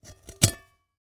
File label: Household Pan Break Away Pan Fold